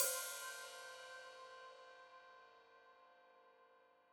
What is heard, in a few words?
Hat Open, Hi-Hat; Deeply Sampled Drums